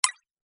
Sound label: error